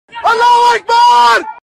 ak grenade, weapons

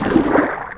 jump slosh

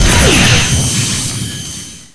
bfg fire

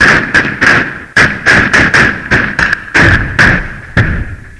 amb

